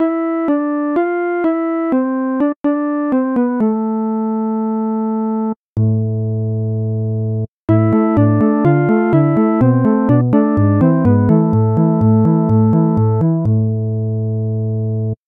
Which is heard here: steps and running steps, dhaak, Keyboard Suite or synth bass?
synth bass